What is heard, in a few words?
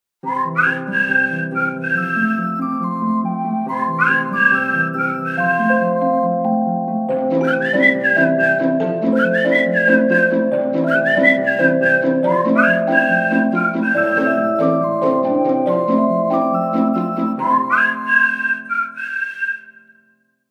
Whistle